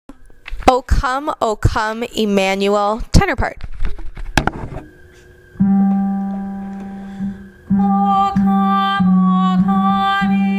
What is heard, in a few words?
o come emmanuel tenor